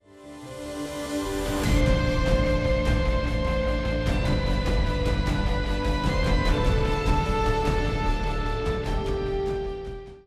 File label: Preview Stinger; apex legends season 12; Newcastle